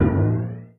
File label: Enemy Bossegg Move; disney star smash android ios mobile gamerip 2020